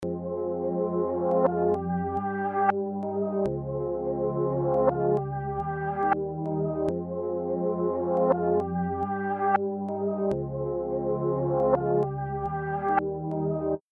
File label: Luxury Sample